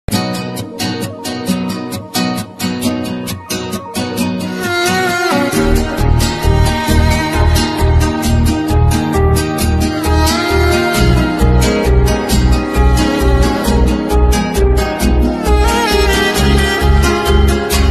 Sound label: LOVE FAILURE BGM RINGTONE